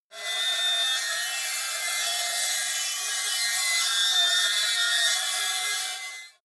metal lid screech

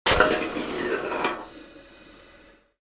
hydraulic door

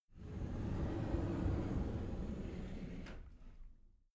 cupboard slide door open soft

